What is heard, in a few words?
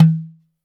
Fingadrum, Percussion Hits; Zero-G; Soundclash; Acidized Wavs